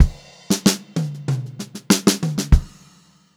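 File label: STRAIGHT FILL, Funk-Groove Drumloops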